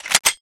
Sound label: bolt pull; rhetscar; ohemgee; weapons